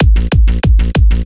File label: Kick Bass A